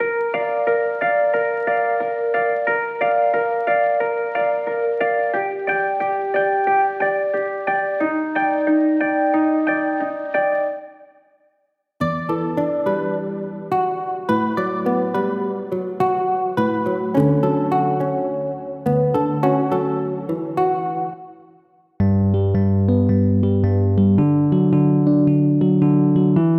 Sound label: Folk Indie